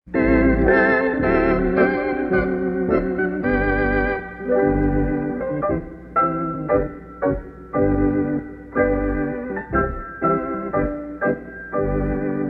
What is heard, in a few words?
instrumental